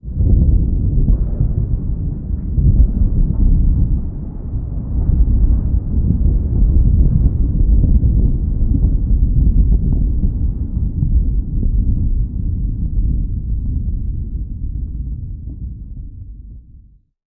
Earthquake Small

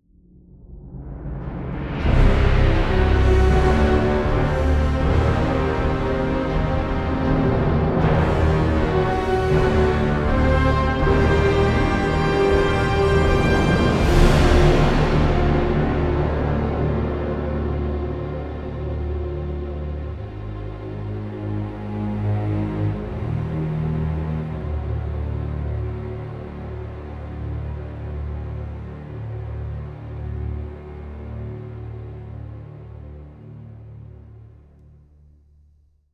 80bpm 4-4; Planet of Lana

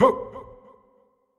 Effort Tiny; Marv

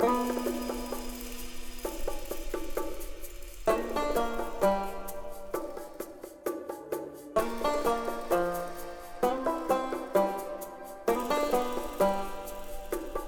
Diegetic Music Biome Gate Desert; lego horizon adventures

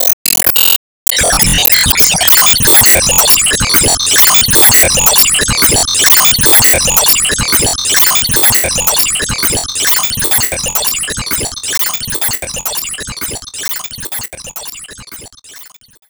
Enemy Ufo block Active Loop

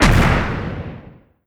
Enemy Bombbumpo Active Blast; disney star smash android ios mobile gamerip 2020

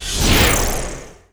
Enemy Sandwall Appear

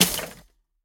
Weapon Electronics

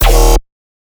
Bass Tech Shot 160 D#

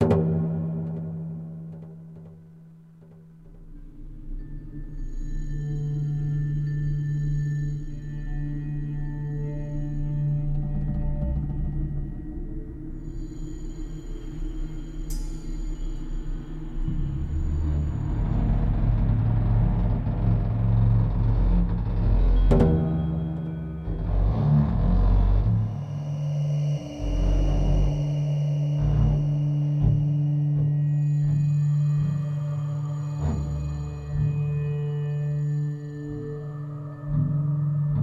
Amb Indoor

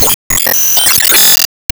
Enemy Fashion 2nd Heal; Disney Star Smash; Android, iOS, Mobile

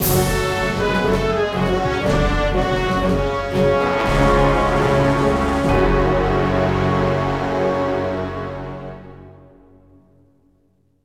Combat Lose; The Hobbit; PS2

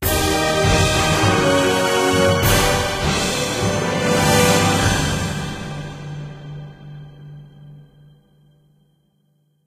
Match Victory

